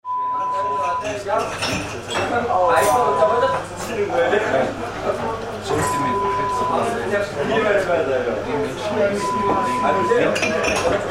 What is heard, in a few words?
German Bar sounds